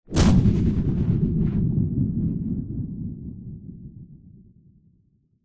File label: sound design impact heavy rumble tail; zapsplat